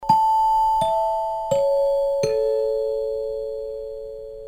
four chimes descending

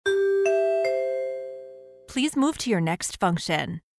chimes fast please move to your next function; nbc chimes fast

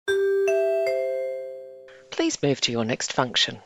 chimes fast please move to your next function

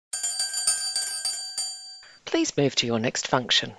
bell please move to your next function

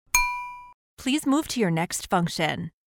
short ding please move to your next function

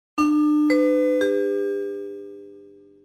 nbc chimes medium

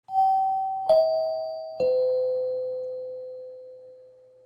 three chimes descending

